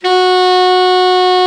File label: Alto Sax; Sound & Vision - Gigapack II CD 1